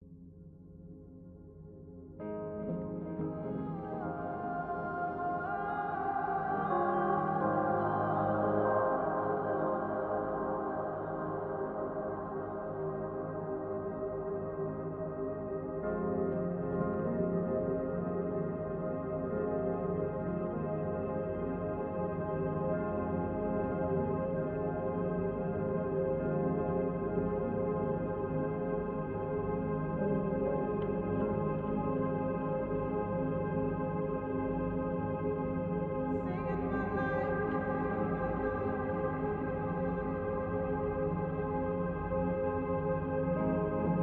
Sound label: Sleep Soundscape; Endel